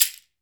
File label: MEXICAN, Latin Shakers